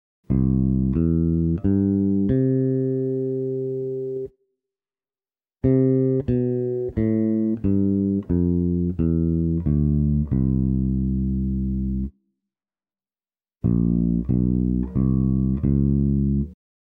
walking bass

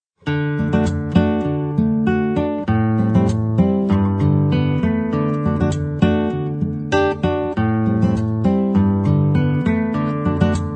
drum rock

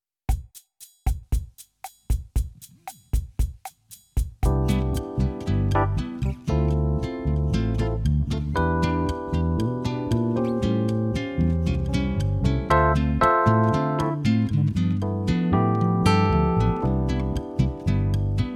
samba bossafeel

